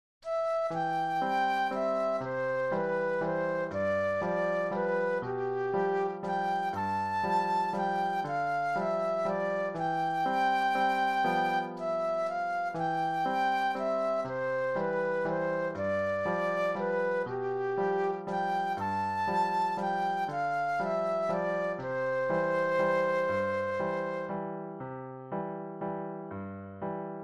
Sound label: Flute Solo